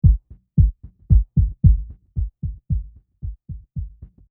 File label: kick